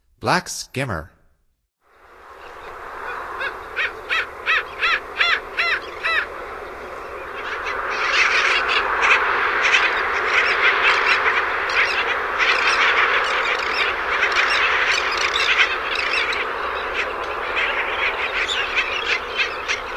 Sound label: Black Skimmer; North America; Stokes